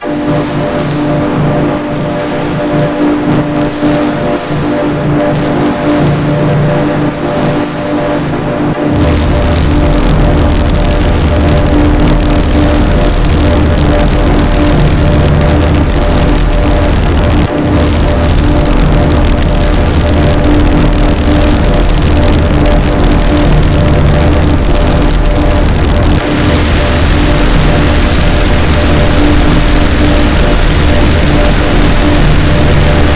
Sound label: distortscape; strengthbrain